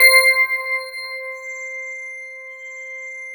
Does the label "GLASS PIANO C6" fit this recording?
Yes